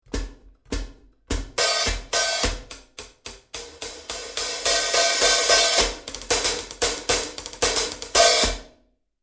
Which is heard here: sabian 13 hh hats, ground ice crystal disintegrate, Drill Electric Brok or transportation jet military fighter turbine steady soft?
sabian 13 hh hats